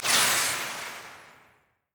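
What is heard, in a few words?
summonerspell base flash return; LoL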